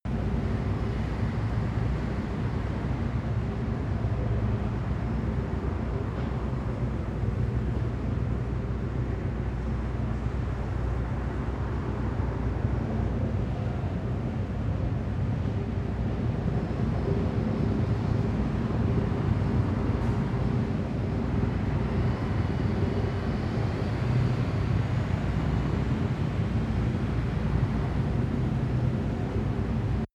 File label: construction site machine distant